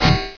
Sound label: armor; asciisec